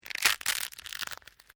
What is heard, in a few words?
Dry Brittle Snapping Long; Various Organic Foley sounds; Human; SoundEffects Foundation2